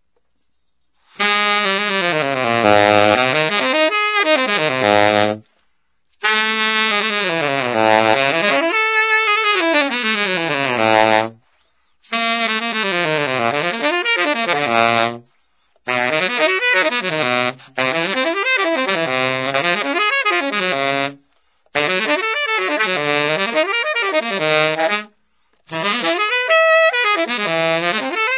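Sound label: tenor arpeggio; lacquer; morgan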